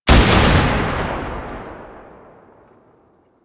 Sniper Shot; DE; Warframe; Frumentarius; Exalted Sniper

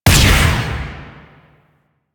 Pistol Charged Shot; Weapons; Warframe; Drifter; Operators

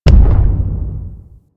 Arachnoid Camper Distant Step; Warframe; Corpus

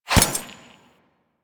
Glass Shatter Damage Hit; DE; Warframe

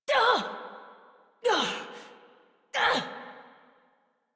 Struggle Long, Adult

